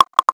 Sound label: message